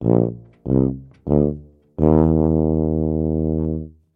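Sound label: sounds of tuba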